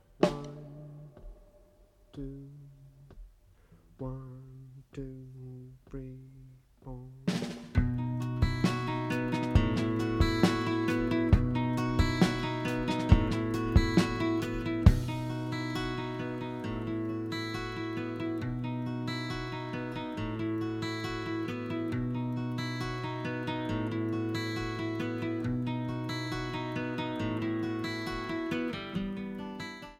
Backing Track

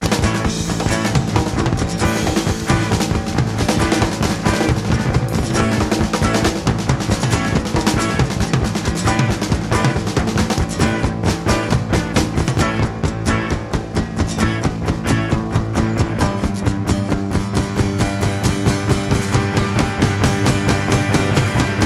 (Drum Solo)

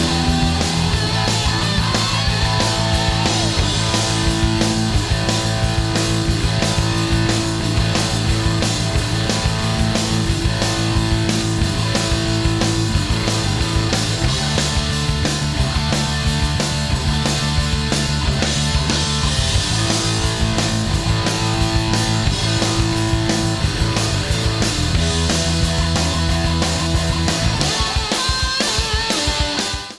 Rock And Roll Instrumental

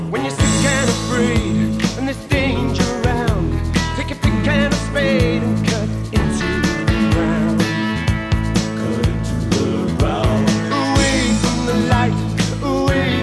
Lead Vox

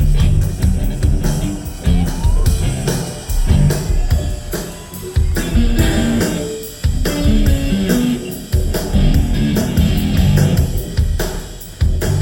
Bass Solo; Live In Portsmouth - January 27, 2002

